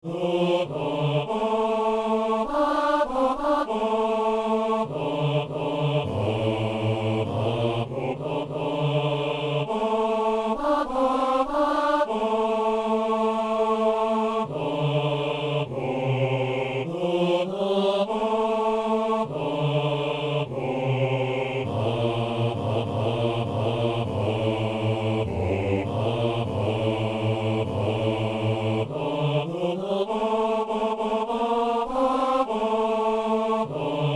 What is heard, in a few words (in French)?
messe sanctus basse; Messe inaugurale